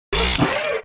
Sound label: walk; tridroidznnpc